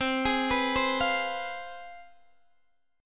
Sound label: C Major 7th Suspended 4th Sharp 5th, C, down, Guitar, Open D